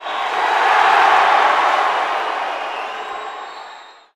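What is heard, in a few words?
Basketball Crowd Reaction; SFX Kit; Crowds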